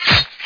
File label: Slice